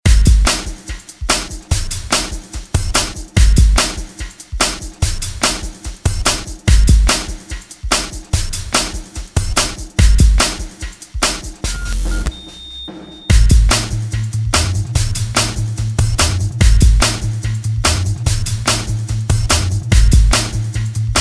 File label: BED The Perfect Simple Beat